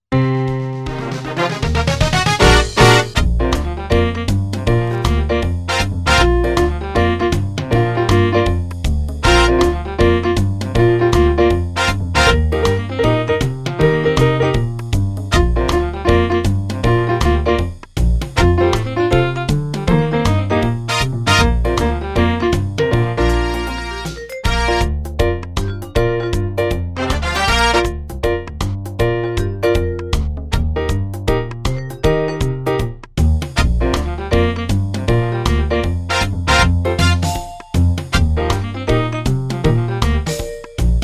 Open Valve Blues

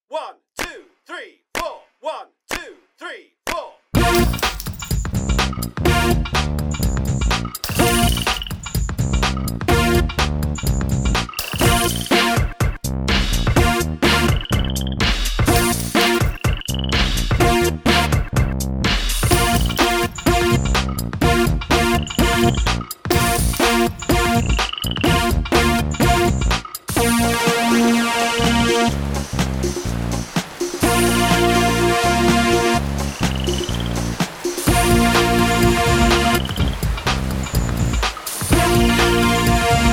Alarm clock rock Bb

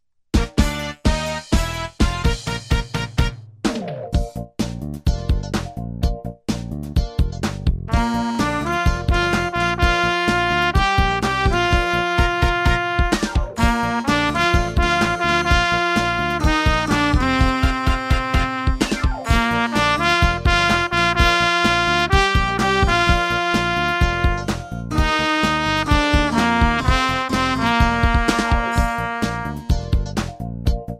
JAZZ

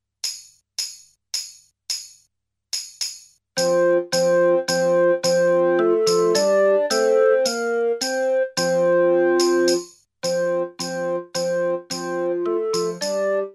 Galliard, 108

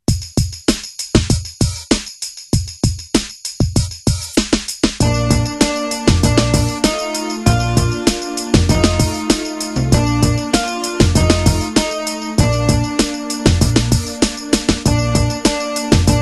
Au Clair de la lune Duet

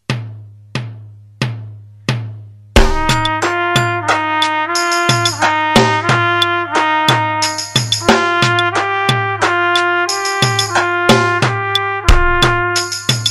Easy Slurs, Fast Metro Track

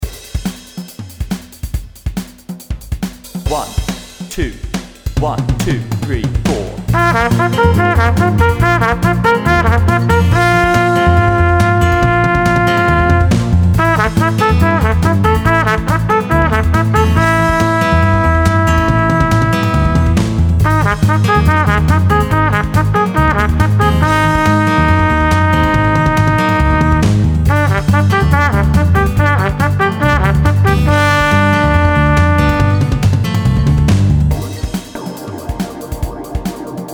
Speed Slurs Bb example @140

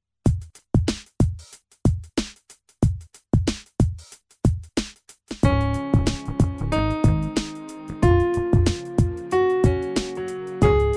Five Note Scales [Eb]

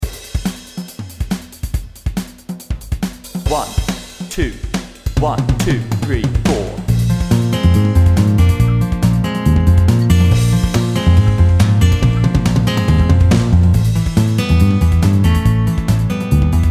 Speed Slurs Bb, 140

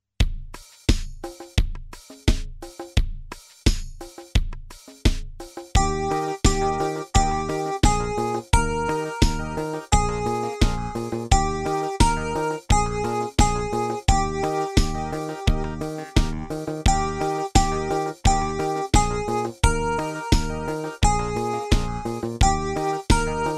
Au Clair de la lune Duet

